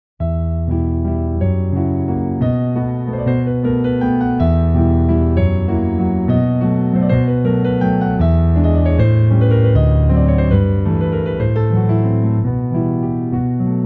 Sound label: Waltz